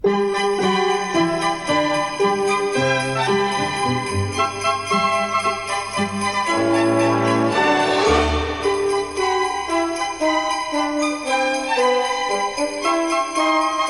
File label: JINGLE BELLS